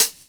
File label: Hhat, Hihat; Equipped Music; Loungin House